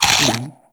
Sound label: Burger Pick Up; Argon; Warframe; Nineteeen Ninety Nine; Deep Archimedia